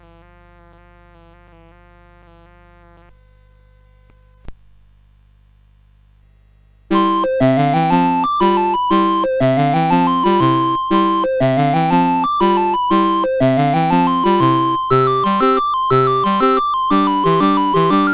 CSG8580; CSG8580R5

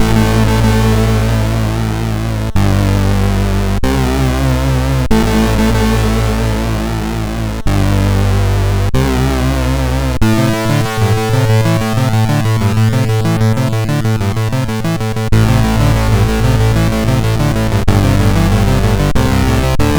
sid MOS6581R4

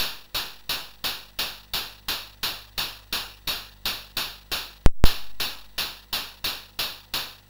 BASIC, MOS6581R2; C Prog Ref Guide example; Commodore

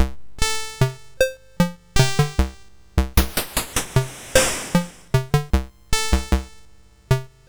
sid, MOS6581R4; Classic Concentration

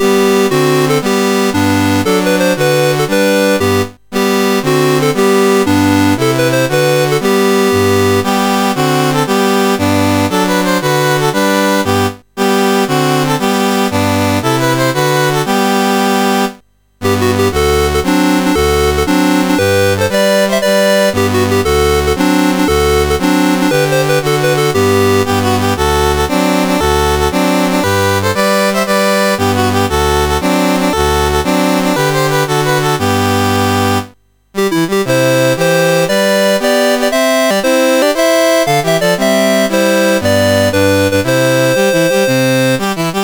CSG8580R5, National Anthems; Philippines

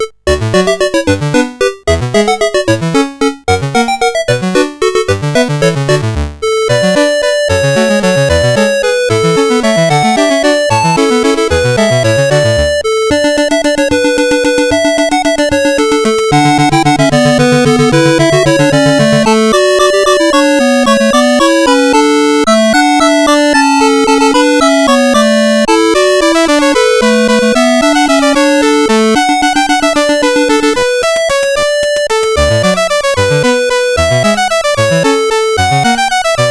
Fireworks Celebration, CSG8580R5

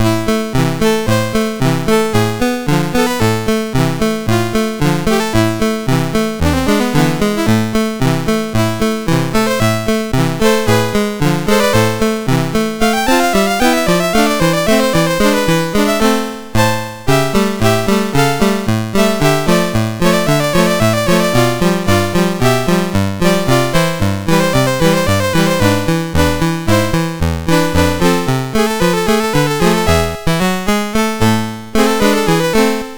Hungarian Dance No5, MOS6581R2

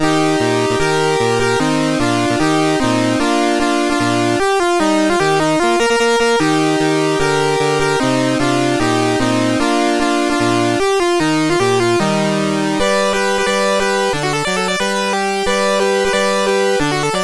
Fanfare, MOS6581R2

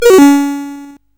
sid CSG8580R5; Cavelon

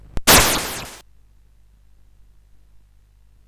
sid CSG8580R5; California Games

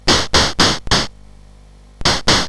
BASIC sid, CSG8580R5; Baji

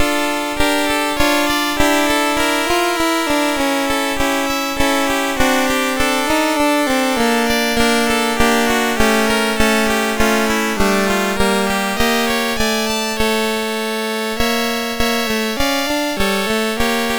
Sarabande, MOS6581R4

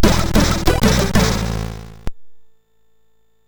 sid MOS6581R4

